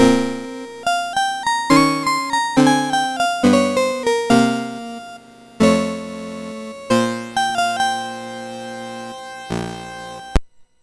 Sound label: Mini Piano Demo, MOS6581R3